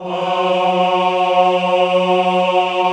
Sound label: CHO F#2, Aaahh; Sound & Vision - Gigapack I CD 2 (Roland)